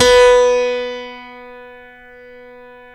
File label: StereoGrand, Keyboards